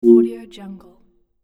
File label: soft floaty browse menu sound; tony-parsons-music